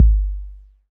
long room reverb bass drum g key, Royality free bass drum single hit tuned to the G note.